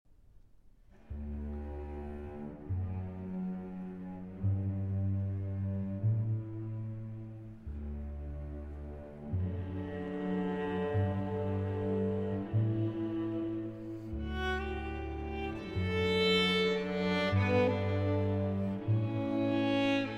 Violin & Orchestra; Arr. For Violin & Orchestra By Rosemary Furniss

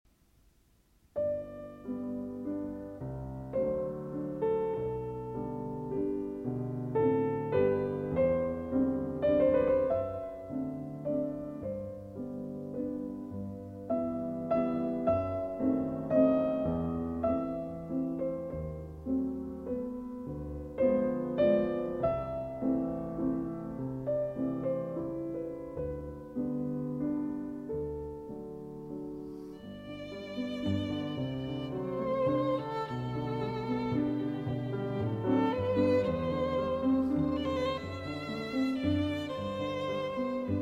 Piano Trio; C. Schumann; Op. 17; Andante

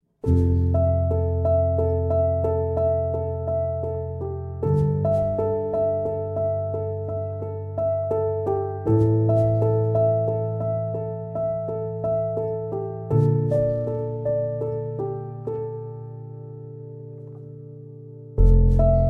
Solo Piano Version

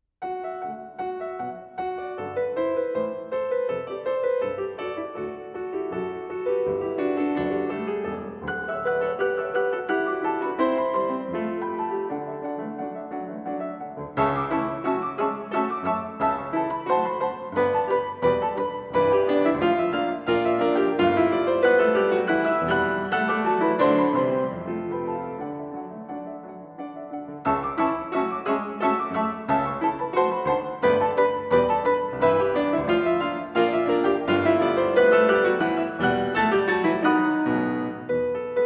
Divertimento For Piano I. Allegro Giocoso

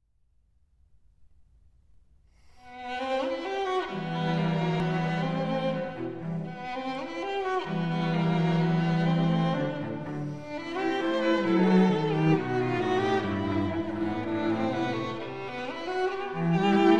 string quartet

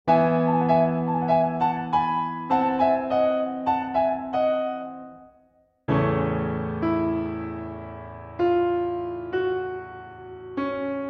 Solo Piano; Thais; Fantasie and Selections (Pt. 1)

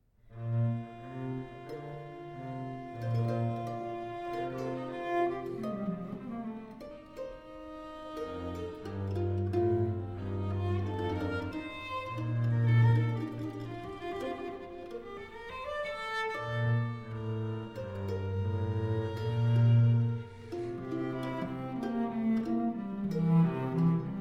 Sonata For Viola da Gamba; No. 3 in G Minor, BWV 1029; II. Adagio

